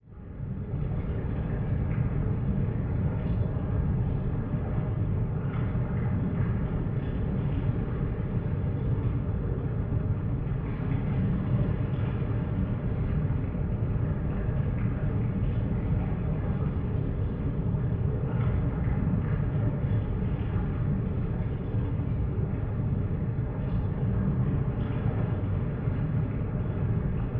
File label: Liquid Water Drain Interior